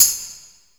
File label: Roland f30 drums